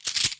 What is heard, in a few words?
reload shotgun; plague